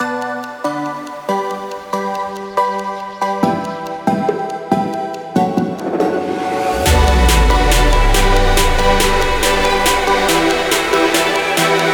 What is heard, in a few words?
Mello; Unison